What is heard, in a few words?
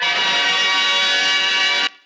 tada, stabs